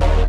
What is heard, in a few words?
Chord